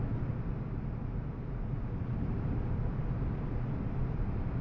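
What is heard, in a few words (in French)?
Chauffe Eau Loop; Puddle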